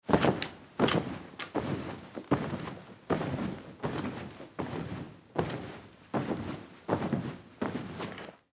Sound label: Bed Jump On; Hotel Room; Foley; Bedroom Sound Effects; Household; Blastwave